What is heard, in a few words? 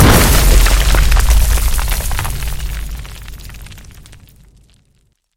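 heavy howitzer hit on a target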